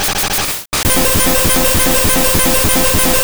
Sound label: Leech Seed; Pokemon; Gen 2; attack moves; GSC